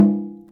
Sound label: Bongo